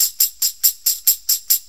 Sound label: 6-8 Tamb, Perc Loops; Drum Tools